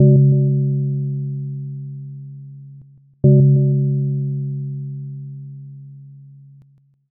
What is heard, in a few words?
gong clock